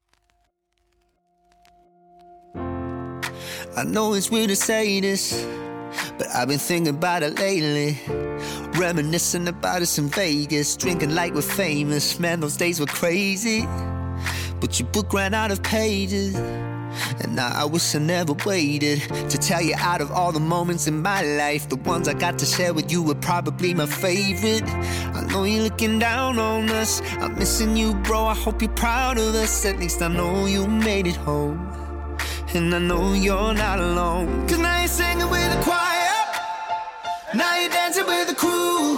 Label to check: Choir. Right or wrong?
Right